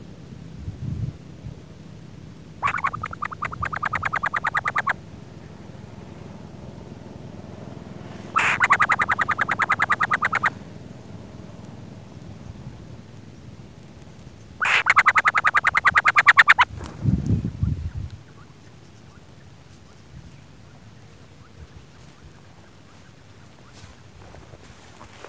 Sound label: Burrowing Owl Athene cunicularia